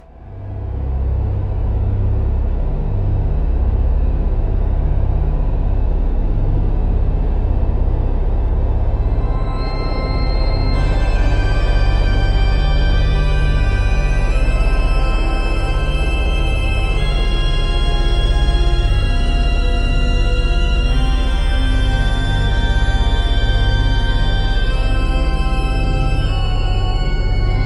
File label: spiritual spooky soundscapes